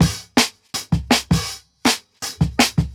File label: 162, Jungle Breaks; Original Jungle Breaks